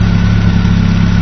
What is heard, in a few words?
Pickup; 暴力赛车